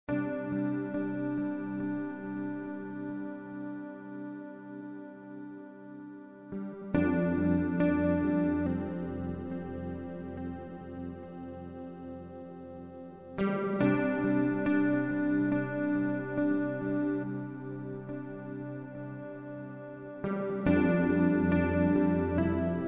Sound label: Ballad in A JAMTRACK